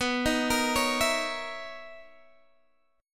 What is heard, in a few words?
B Major 11th B down Guitar Open G